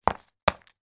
game money notes side of wad hit on table; monopoly

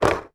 leisure board game box place down; yahtzee

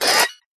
science fiction computer; Audio Mangler

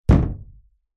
impact wood thump hit hollow

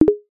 multimedia pop up alert tone

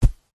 footstep single walking on thick fabric stomping; zapsplat

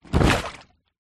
leisure lilo popped full of water place down

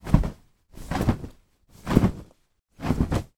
foley blanket shake multiple times; sound effects one

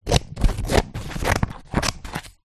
office scissors cut paper; zapsplat

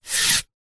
wood long branch pole slide hand; foley

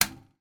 household toilet brush bang in side of toilet x1

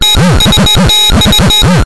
science fiction computer malfunction loop

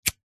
foley cigarette lighter plastic flint light; zapsplat

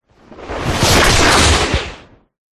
sound design hit whoosh into a punch like impact; zapsplat

